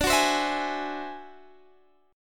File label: D 9th Flat 5th D down Guitar Open G